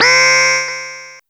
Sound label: gpu temp alarm; FurMark 1.9.2